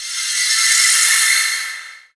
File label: Wash Up, Ambient; Zero-G Jungle Warfare 1, 2 & 3; 90 s Sample CDs